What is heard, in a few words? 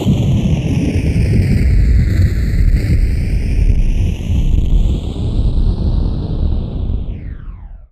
NOISE, Production Effects